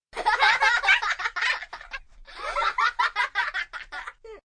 Group Belly Laugh, Laugh, Group, 2-4 small children, laughing at joke, kids; males, females, girls, boys, human voice; VoiceBox